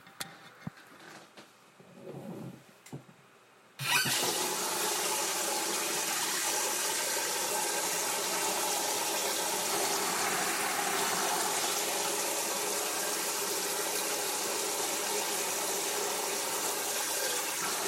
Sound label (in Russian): napolnenie gigantskogo plastikovogo vedra na kuhne vodoj s pomoshyu nasadki dlya shlanga